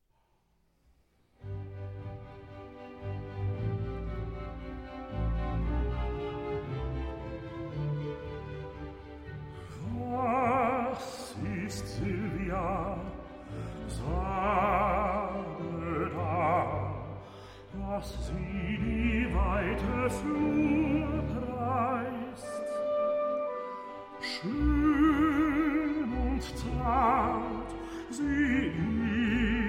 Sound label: For Baritone and Chamber Orchestra; Schubert An Sylvia, D. 891; Arr. Schmalcz